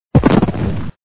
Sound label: Explosion; Super Mario 63